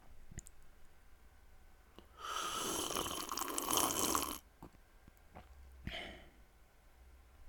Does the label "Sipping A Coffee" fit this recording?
Yes